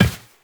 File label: Step Glass, Footsteps, Walk; Warframe; Characters; Tenno